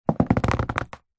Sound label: luggage briefcase push down pressure apply creak